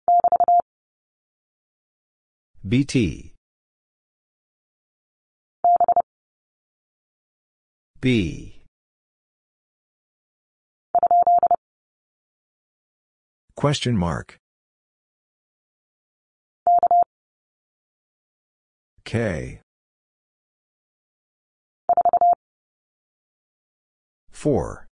BT Single Character 30wpm, morsecode; Lesson 36c

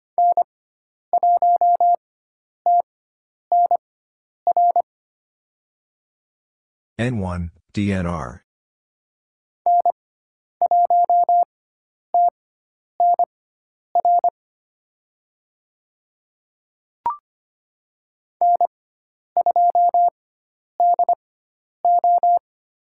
Call Signs 25wpm; Lesson 16g

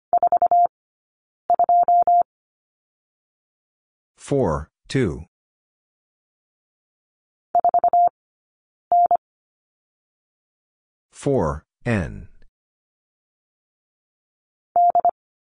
Number 5 Two Characters 25wpm; Lesson 15d

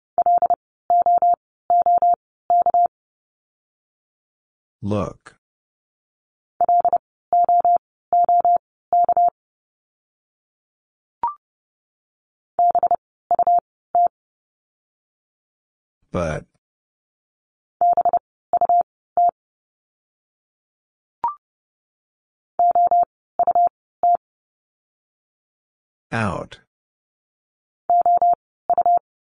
Letter q - Words 30wpm, morsecode

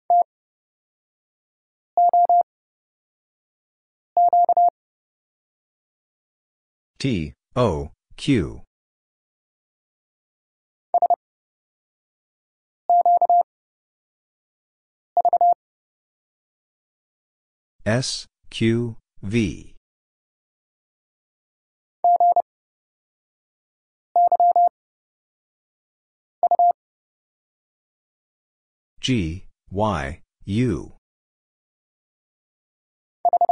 Letter q Three Characters 30wpm; Lesson 38e

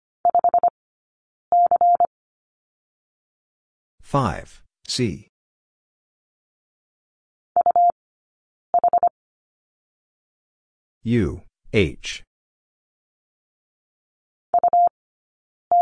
Letter u, Two Characters 25wpm, morsecode; Lesson 17d

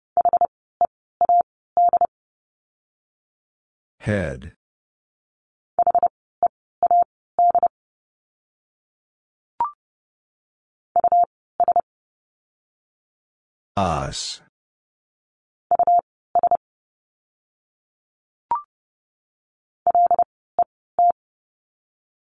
Letter u Words 30wpm

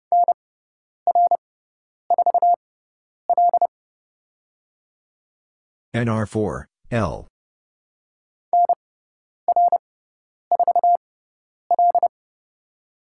Letter u - Call Signs 30wpm, morsecode

